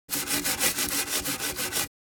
Cleaning scrub brush scrubbing back and forth motion; household